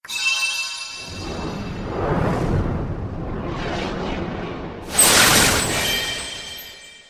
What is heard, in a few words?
chime; raising heart; nanoha